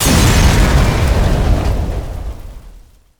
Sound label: Explo Medium Vehicle; Destruction